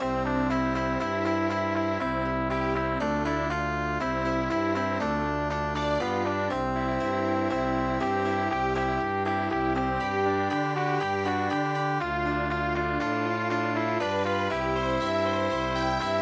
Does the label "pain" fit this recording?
No